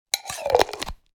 Glass medicine jar lid closing

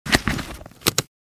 School bag backpack buckle clip open; luggage

